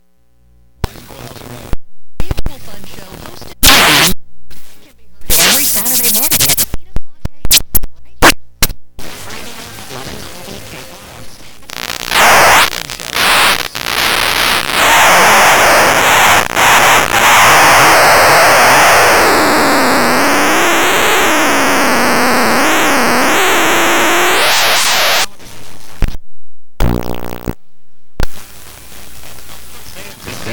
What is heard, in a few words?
crackle, cracklebox